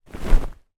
household pillow movement childs

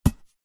sport soccer ball catch two hands; zapsplat

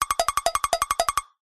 cartoon run scramble percussive cowbell

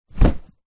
sport exercise mat foam thin rolled up set down

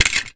transport car jack down on road

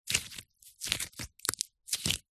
industrial putty handling; zapsplat

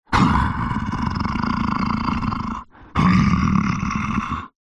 horror yeti monster growl snarl; zapsplat

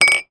household small drinking glass knock over

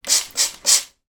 household bleach cleaner 3x sprays onto surface

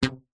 foley rubber band ping med pitch

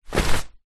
rain jacket drop on floor

